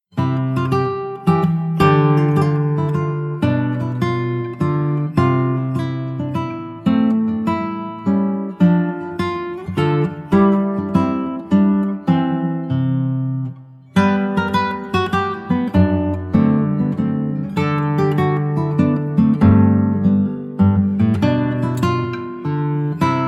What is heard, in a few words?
mazurka